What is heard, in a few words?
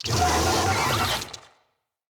Infested Big Ashen Vocal Attack